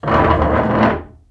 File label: chair move; DeadFright